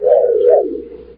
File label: scanner Sweep; Knight Rider